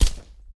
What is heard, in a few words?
mp7 silencer; STALKER